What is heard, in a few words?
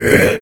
death satyr small; dota; creep deaths